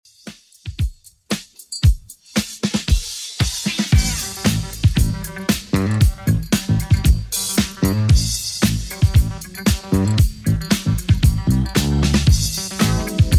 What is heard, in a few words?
80s Redrum 115bpm